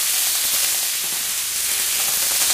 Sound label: Burger Cook Loop; Food Truck Game